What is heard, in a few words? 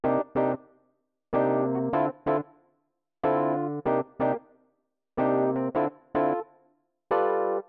125 Rhodes chords C, Keys, Nu Jazz House; PowerFX - Nu Jazz House